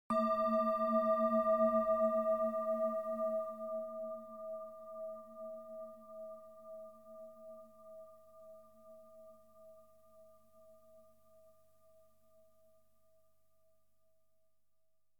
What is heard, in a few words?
Gong